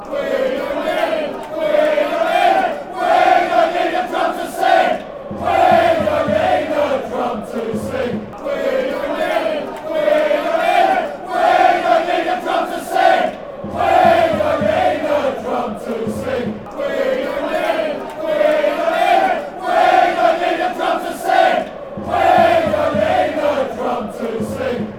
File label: we dont need drum sing; bhafc